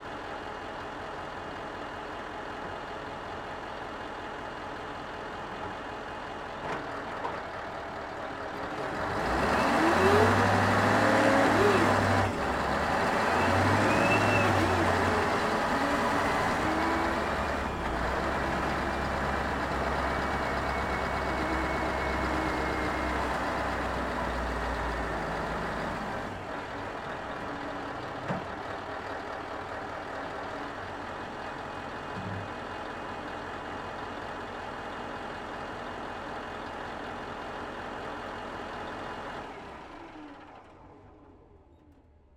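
Stridsfordon 90 ONBOARD SLOW Drive Various ENGINE Intake; Combat Vehicle 90; DPA4062; Pole Position Production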